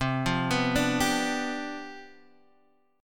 C Major 7th Suspended 2nd Suspended 4th, C, down, Guitar, Half Step Down; CM7sus2sus4